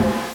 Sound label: SNARE, NES DPCM